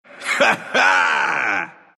laugh ru; Dota 2

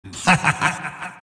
monkey king monkey crown laugh

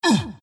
wraith pain; Dota 2; skeleton king

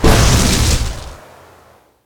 Elder Dragon Form attack

